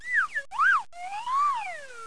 slide; 10,000 Graphics Pack Volume 1; Media Graphics International

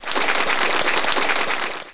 CLEARED

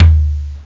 floor tom; gmplay; drums

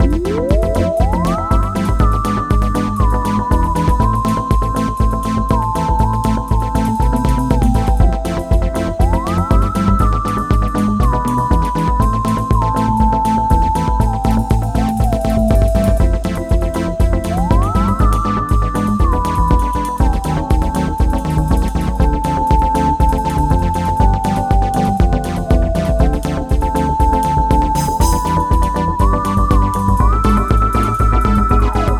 techno loop

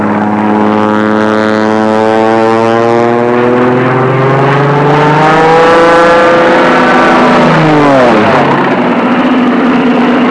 barnstormer, Transportation

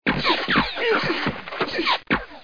explo

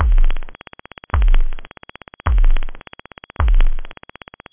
tone; da capo vol1; drums technobd

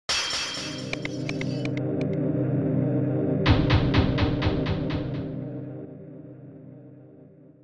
boot; Genesia; Mindscape

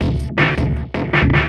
DRUMLOOP D+B 160, Drum+Bass Loop; eJay

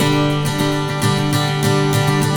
Pop Action, Acoustic Guitars; ACID MUSIC

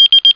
Page New

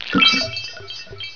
enchant; THEREALM

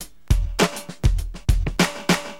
Drums, Acid Jazz Funk; LiveAct Pool

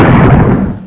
sonic

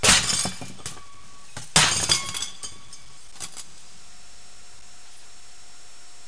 dishes

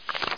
Scroll; catalogs

